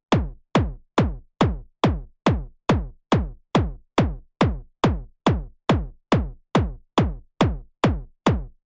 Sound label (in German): Kobol Bassdrum summierte Huellkurven